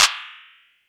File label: Clap